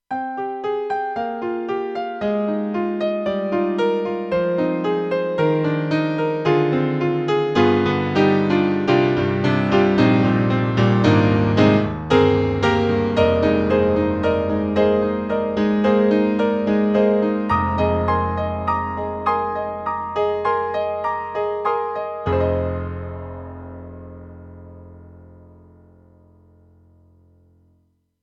Nice Piano; Roland JV-2080

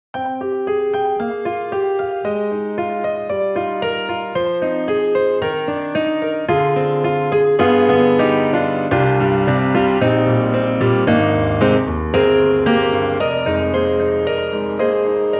Grand Rhapsody Piano Goto Grand; Waves

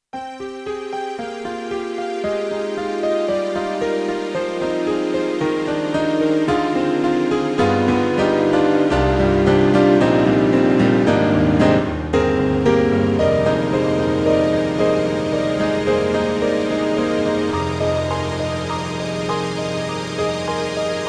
Yamaha MU1000EX Piano Str

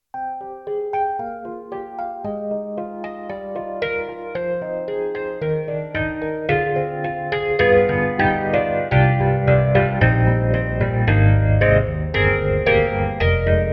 SD-80 Stage 73; EDIROL

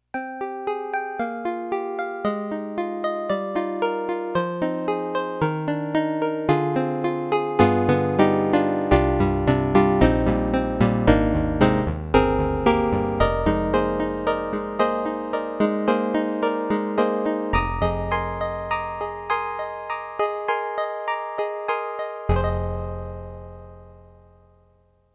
KORG NX5R AcouPiano1

